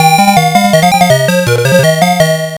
arcade pattern clear; yie ar kung fu

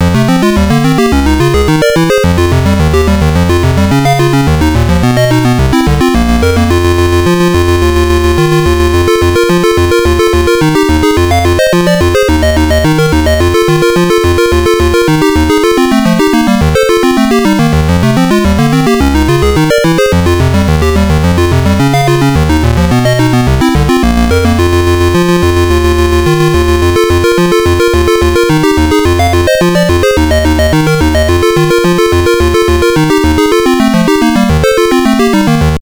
msx title; boulder dash

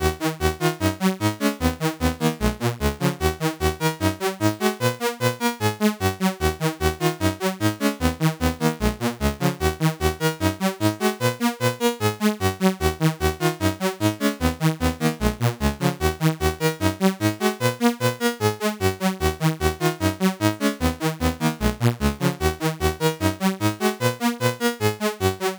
c64 in game; lazy jones

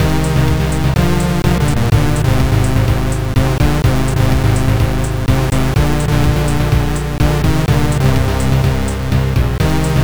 amiga in game; golden axe

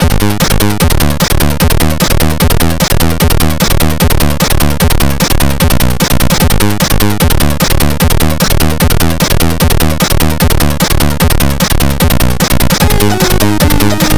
c64 in game; r type

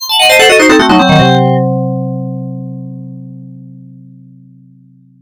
arcade player out; commando

